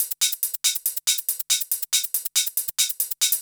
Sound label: Hi Hat Loop, Dance, Beats